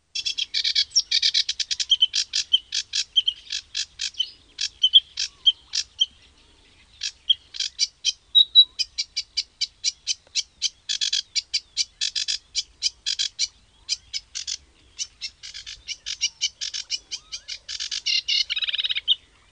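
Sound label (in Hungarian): Foltos nádiposzáta; Madarak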